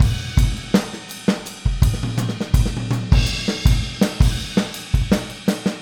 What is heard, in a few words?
Boogaloo Fill Full Mix, 82 BPM; Sonic Foundry; Drums from the Big Room The Mixes